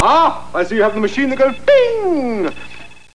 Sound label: ping